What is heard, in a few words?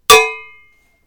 Cencero, instruments